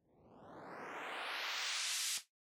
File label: Rise